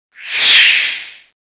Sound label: dragon swoosh